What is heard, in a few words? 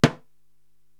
Timbale Lo Mute, Korg TR-Rack